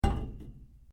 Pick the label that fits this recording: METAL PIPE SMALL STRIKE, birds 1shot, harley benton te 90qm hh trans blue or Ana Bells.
METAL PIPE SMALL STRIKE